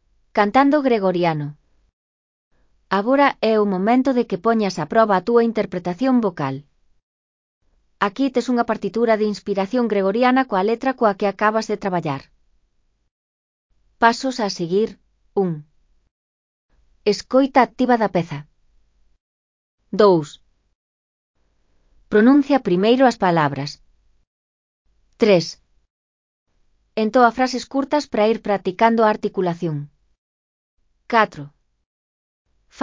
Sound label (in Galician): CANTANDO GREGORIANO